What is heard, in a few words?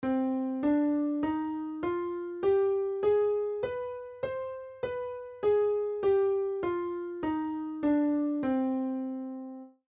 c hm scale